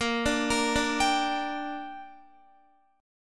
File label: Bb 6th, Guitar; arpeggiated; x,13,14,15,14,15; 4F,4A,5C,5G,5C,6E